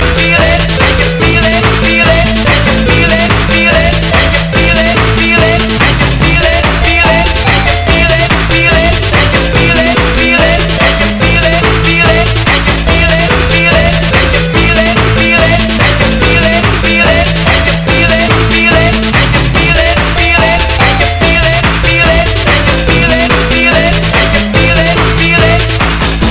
techno tetris

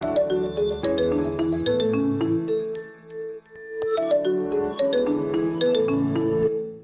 New Nokia

